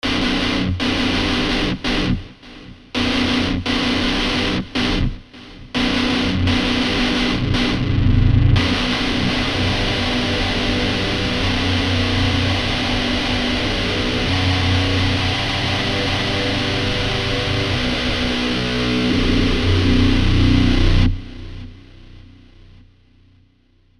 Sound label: Thrash Guitar